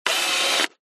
technology camera dslr lens zoom canon dslr eos 6d; zapsplat